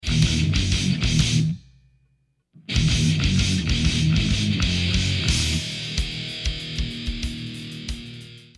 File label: dun dun dun dun dun dun